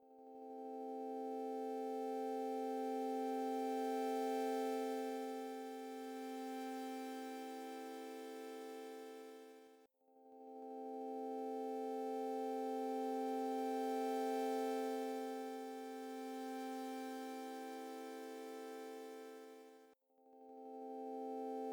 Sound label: wave chord; highschool english